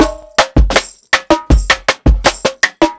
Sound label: PICCOLO 80 BONGO, drums; Basement drums vol 2